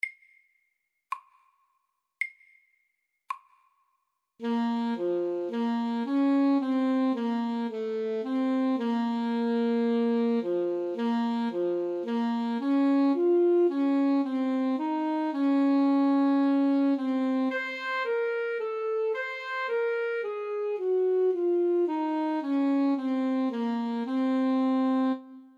Etyde i G moll SAKTE

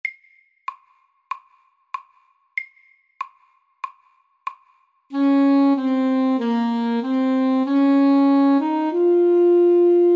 Etyde MIDDELS

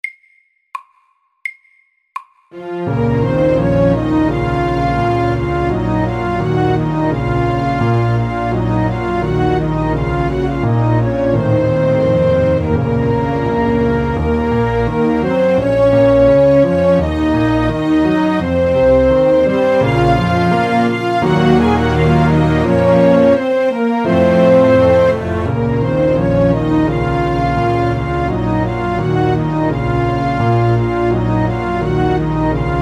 Gammel fransk melodi

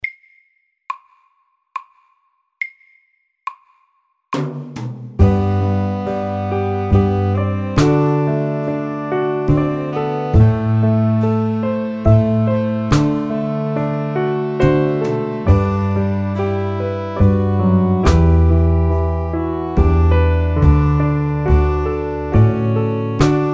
Soft rock SAKTE

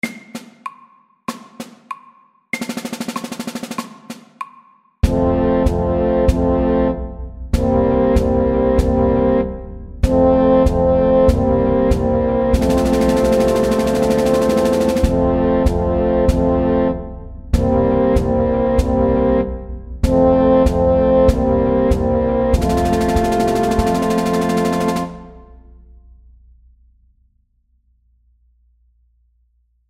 MIDDELS; Minimarsj

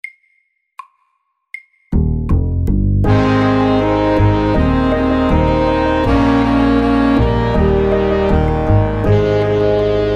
SAKTE; Banana boat song

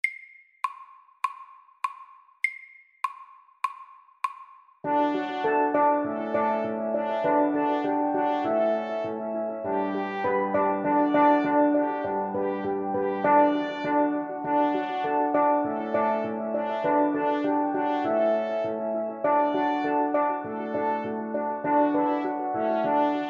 Steel drum band SAKTE

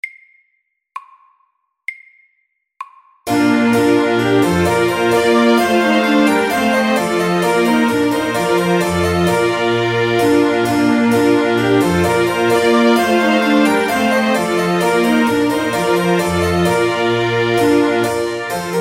Trumpet tune MIDDELS

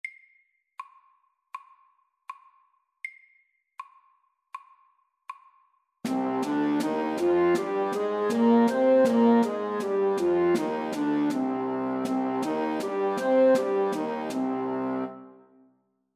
C moll natura MIDDELS

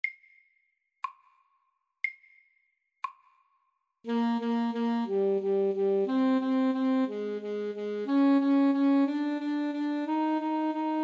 Etyde SAKTE

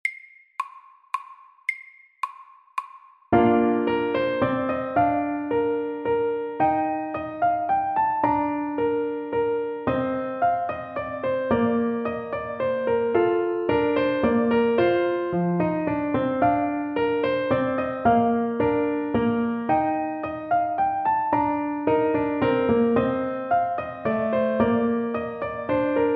Menuett i G dur MIDDELS

